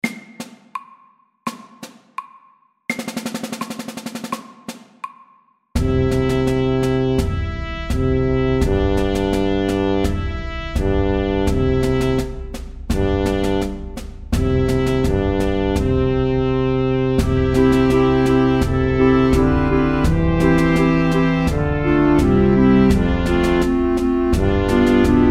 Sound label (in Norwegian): Kryssmarsj, 84